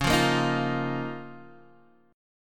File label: Db 6th Add 9th Db down Guitar Half Step Down; Db6add9